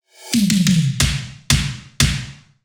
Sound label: 90 drum fill